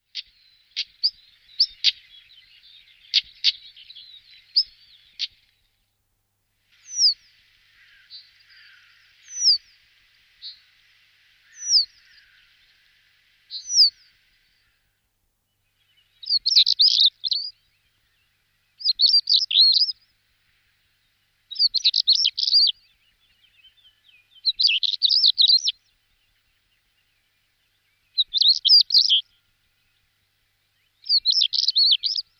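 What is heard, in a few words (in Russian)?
chernogolovyy chekan golos, stonechat voice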